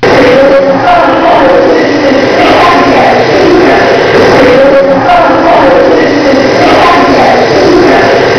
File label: Chanting